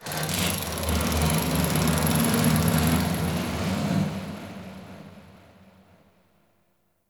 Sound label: Crp Ship Metal Movement Texture Large, Corpus Ship Ambience